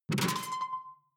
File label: sci-fi alien console high; maschinen; signale; soundpool